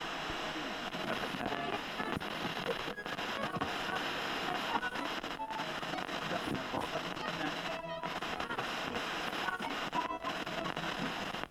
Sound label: FM Radio Tuning